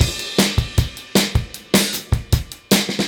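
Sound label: 155 bpm, beat loops; Zero-G; Monster Beats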